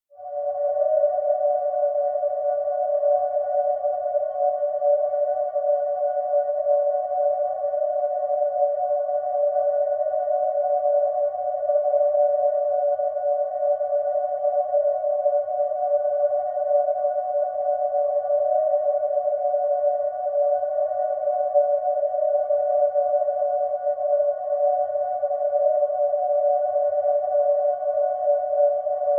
Drone SciFi Tonal Spacecraft Interior Ambience, Drone Scifi Tonal Spacecraft Interior Ambience High Pitch; Sci-Fi (Sound Effects:Science Fiction)